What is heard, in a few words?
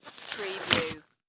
Latex Glove Take Off; Sound Effects Bible